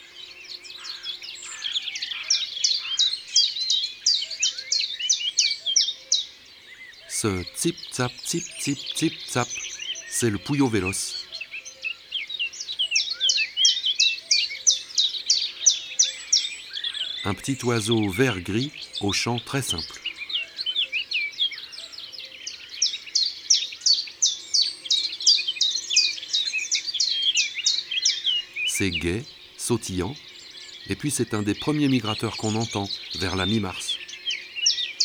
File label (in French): Pouillot veloce ABC des chants d'oiseaux